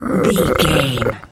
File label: zombie voice grunt